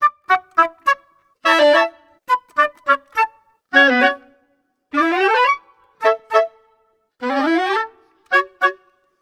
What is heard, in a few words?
105 woodwinds, Orchestral arrangements, 105 D min